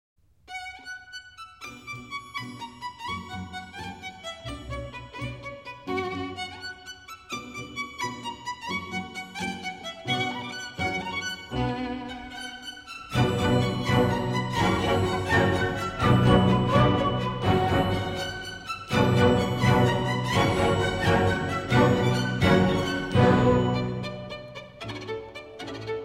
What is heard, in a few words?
Violin Concerto No. 2 in B Minor, Rondo à la Clochette; Op. 7, MS.48; 'La Campanella'